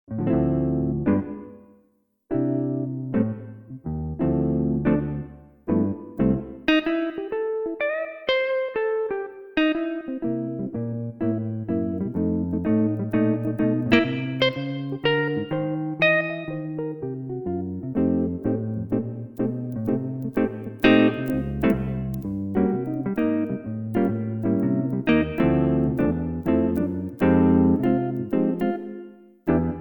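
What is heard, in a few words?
Guitar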